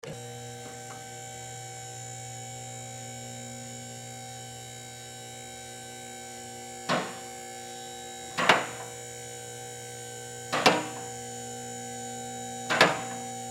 Car Hoist Raise, Car Hoist Raise Mechanic Auto Shop Hydraulic Lift; Industry; Blastwave